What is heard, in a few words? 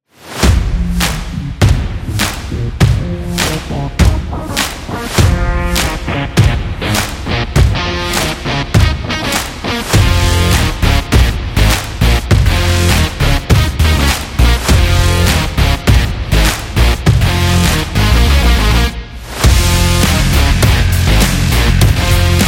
Indie Rock